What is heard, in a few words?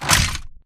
Damaged Torso scrape Concrete; AI Stalker; Titanfall 2